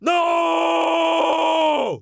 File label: lose; Dice World